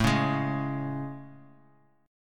A Major 7th A; AM7; strummed; JGuitar

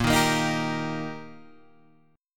Eb Major Bb

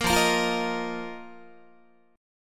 F 7th Suspended 4th F down Guitar; strummed

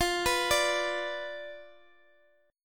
B Diminished F

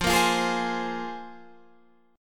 C 7th Suspended 4th Sharp 5th

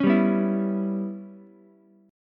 C sharp Minor 7th Flat 5th, Ukulele; strummed; 6,7,7,7; JGuitar

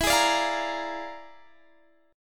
E 7th Flat 5th Flat 9th; E7b5 B9; strummed

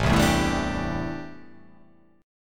A Minor 11th A, Chord

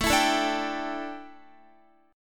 A 7th Suspended 4th Guitar, chord; A7sus4 strummed

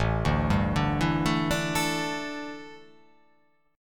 A 7th Suspended 4th, A, down

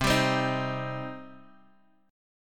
C Minor, Guitar, Open G; down; chord shape

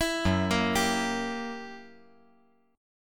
Gb 7th Flat 9th Gb

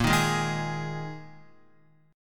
A sharp 7th Suspended 4th A sharp, Guitar; strummed; JGuitar

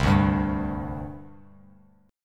C Suspended 2nd, C, down, Bass Standard; Csus2 strummed; JGuitar

